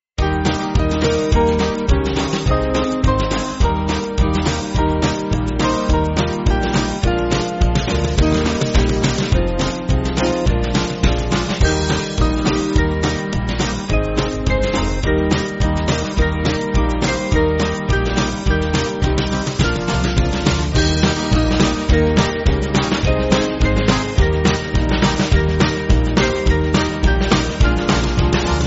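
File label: Music Backing Files for Churches; Step By Step; Simpson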